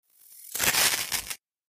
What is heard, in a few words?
Elect Arc Shrt Circ, arcs & sparks